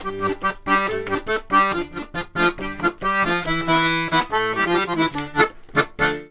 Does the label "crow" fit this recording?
No